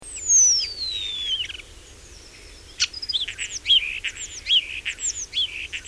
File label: Sturnus vulgaris